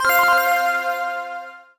COLLECT SUPER; impact

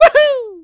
jump; Rayman; impact

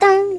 dum; impact

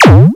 RUN SFX

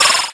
RUN SFX

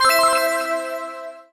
COLLECT ULTRA; impact

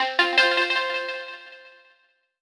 JUMPPAD; impact

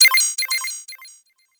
Multi Up

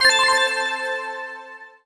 COLLECT ULTRA; impact

